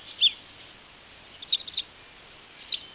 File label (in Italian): Ballerina bianca, Uccelli; Suoni